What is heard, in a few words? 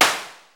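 white noise snare drum; AMG - Vince Clarke Lucky Bastard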